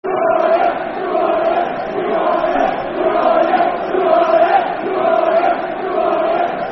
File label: Who Are Ya, Football Chant (Soccer Song); Aberdeen Afc